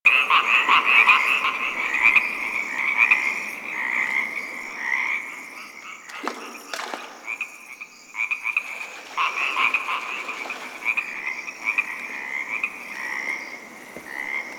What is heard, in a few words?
exterior lake critter loop; Ambience; Props; Warframe; Duviri